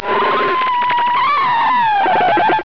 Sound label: Static; Total Distortion